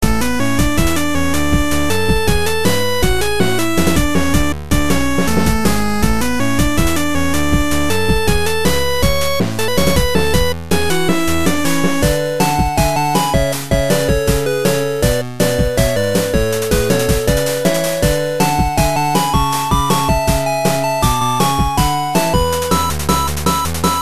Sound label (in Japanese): 明るい曲